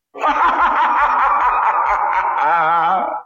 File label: laugh ringtone; HAUNTED MANSION